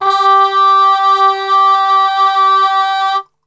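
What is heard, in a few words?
G 2, trumpet cup mute; Best Service; Brass Super Section; TRP CUP MUTE; 90 s Sample CDs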